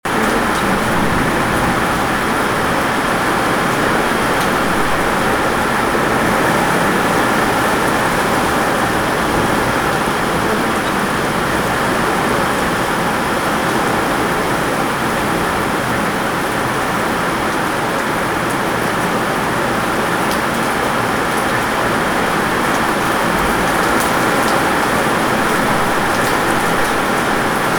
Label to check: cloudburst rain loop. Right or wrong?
Right